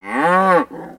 Pet Buffalo Happy; creatures pets; Lego

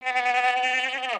Pet Goat Bah; creatures pets

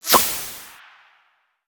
Pets Skunk Stink Spray; creatures pets; LEGO

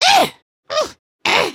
slash ninja caught; emotes

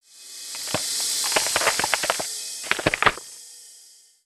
Ice Crackle

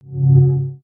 Fire Extinguisher Hi; Daredevil

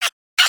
Crab Beg; creatures pets; Lego

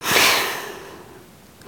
snore out

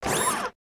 Fail Arm Drop; emotes; Overbuild

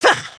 Male Grunt; foley

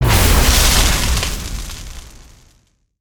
Spinjitsu Lightning End Blast

